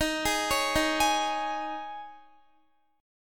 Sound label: D sharp 7th Suspended 4th, Guitar; D#7sus4; arpeggiated